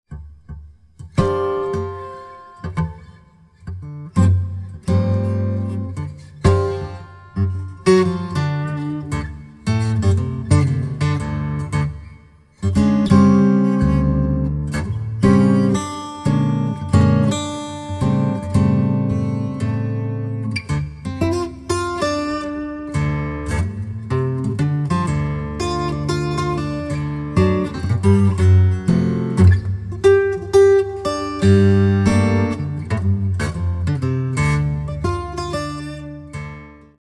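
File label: 12 string, guitars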